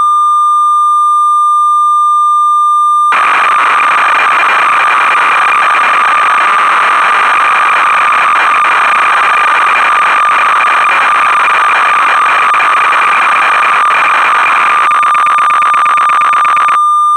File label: oberheim obxa factory patches